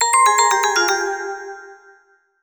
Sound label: E-mail; Sony Ericsson; Xperia X1; PlusFourSix; Venus; Lars Edvall; X1 Family 2